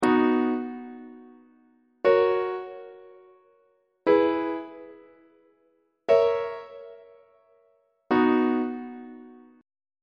c flat major leading tone iac on treble clef

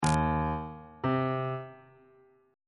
relative minor of e flat major is c on bass clef

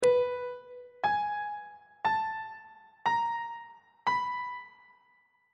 b 7th interval pitches on treble clef

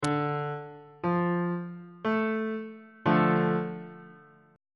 e double flat minor chord

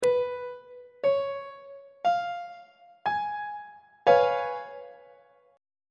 c sharp dominant 7th 3rd inversion on treble clef

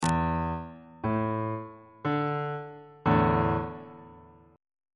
d suspended 2nd 1st inversion

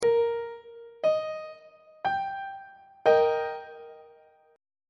e flat major 2nd inversion on treble clef

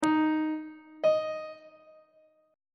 f double flat note; on treble clef

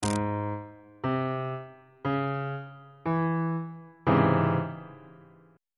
d flat minor major 7th 2nd inversion on bass clef

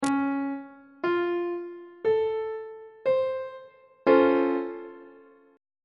c sharp augmented major 7th chord on treble clef

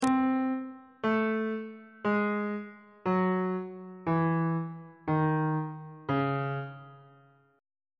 c sharp harmonic minor scale descending; bass clef

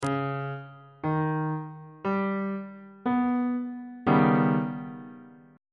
e flat augmented 7th 3rd inversion on bass clef

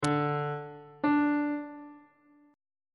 b triple sharp note on bass clef

